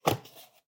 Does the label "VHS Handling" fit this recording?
Yes